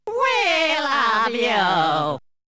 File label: Vocal; ZERO G - Datafile DISC 1